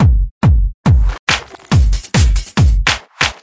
140 bpm, thud thud thud clap, drum loops and breaks; 131 to 140 bpm; samples-ghost